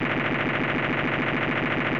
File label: Instrument